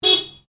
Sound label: CAR SUBARU LIBERTY HORN SHORT; Family members Subaru; Vehicles